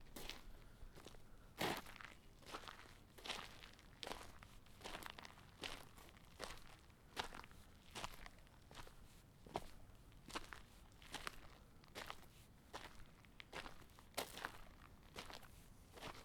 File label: Walking on gravel